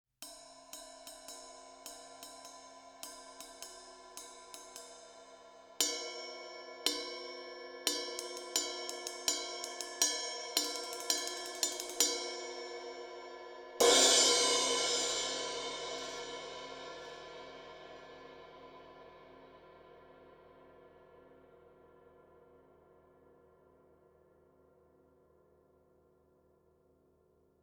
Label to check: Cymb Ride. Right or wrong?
Right